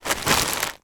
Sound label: plastic shopping bag movement; foley